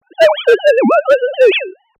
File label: thanks for attending sine